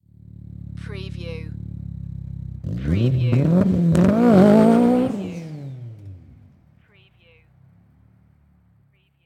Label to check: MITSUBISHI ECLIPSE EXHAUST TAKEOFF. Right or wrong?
Right